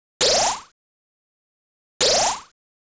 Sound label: Block Firing; Oretachi Game Center Zoku: Quarth